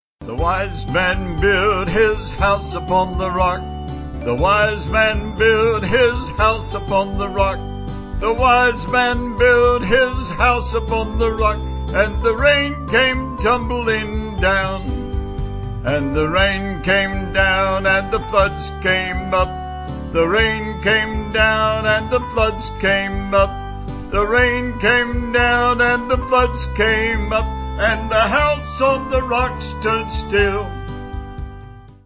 Vocalband; Vocals & Band; The Wise Man Built His House

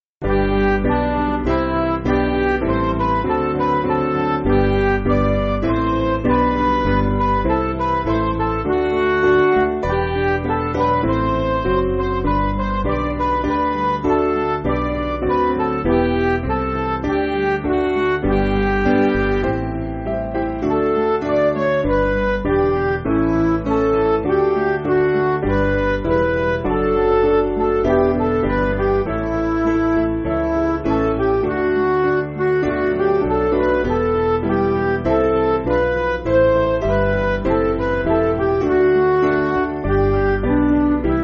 Midi

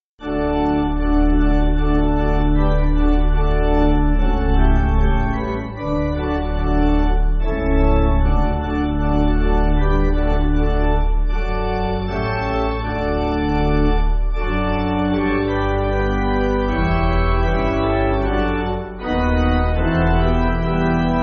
My Heart Is Fixed, pipe organ; Christ for me

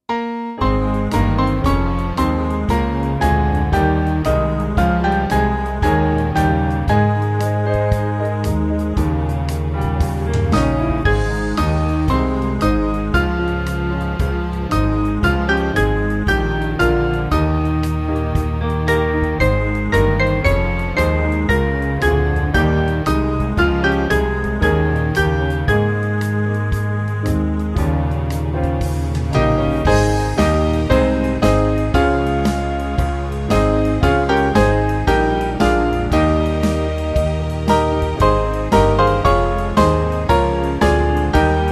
Band; Rise Up O Men; Festal Song

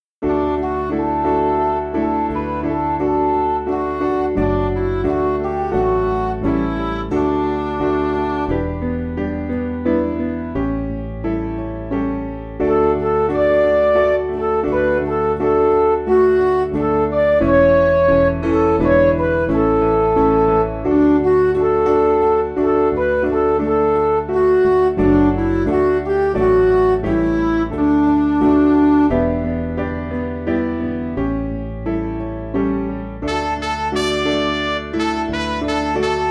Music Backing Files for Churches

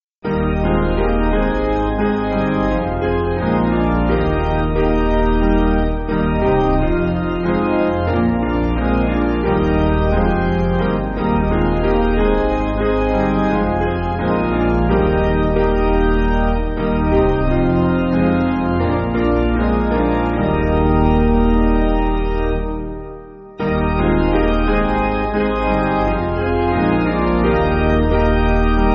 Piano, Free Music Backing Files for Churches; Eb

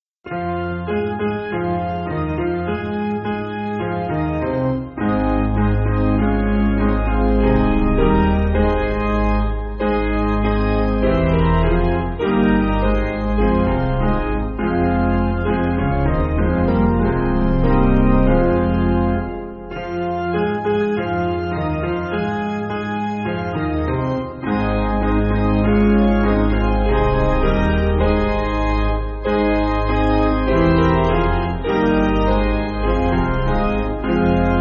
Piano, Music Backing; Come Ye Sinners; Arise

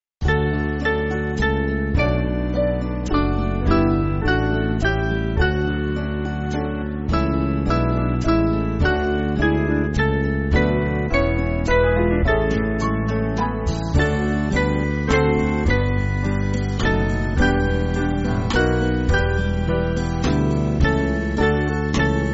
Band, Free Music Backing Files for Churches; Small Band; 5/D-Eb; Forth In Thy Name; Antwerp

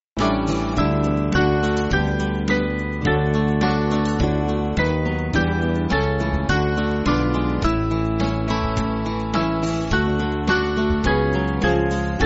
Band; Behold A Little Child; Wheeler

